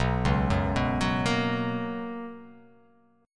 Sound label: B Major 7th Suspended 2nd, chord; BM7sus2; arpeggiated; JGuitar